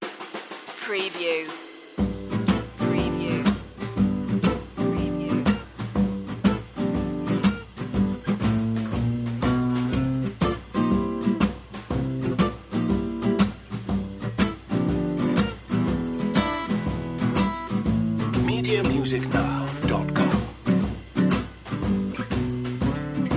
blues underscore

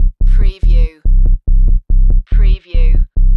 Techno-Trance Sub Bass Loop in C 142 BPM

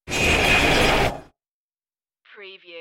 sci-fi door movement

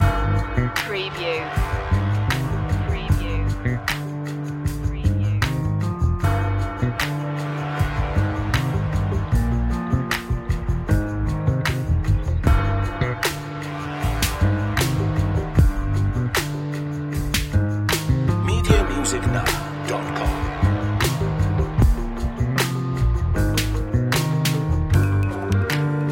gloomy hip hop

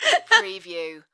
Lady Short Laugh

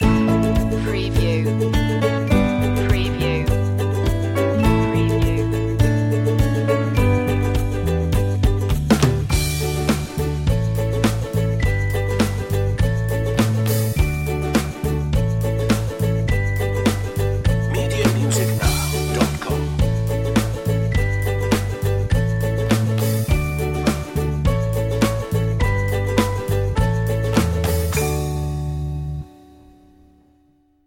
ukulele commercial happy vibes

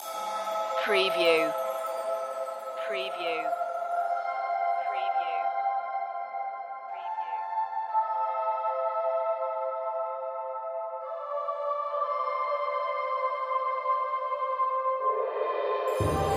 fairy dream magic loop

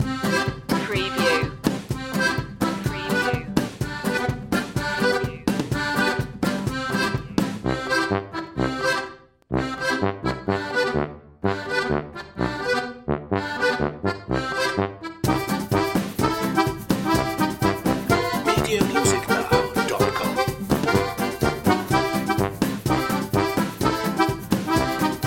cajun gumbo loop

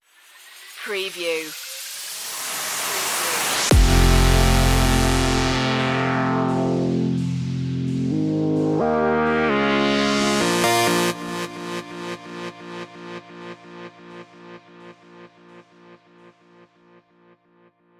sandy shoes stinger

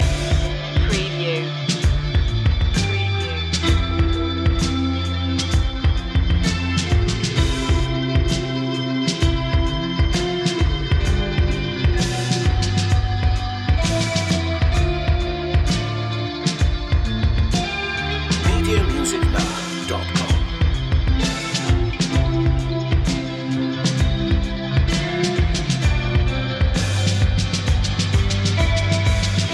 slow loop

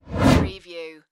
Whoosh; Sound Effects Bible

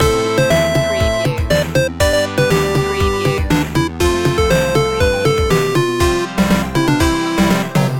silly gameboy loop

